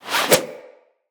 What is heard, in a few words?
Zipline Brake Foley; Gadgets; Parkour Zipline